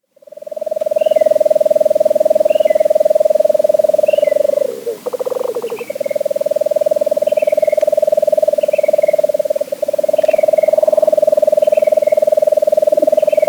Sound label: Lesser Nighthawk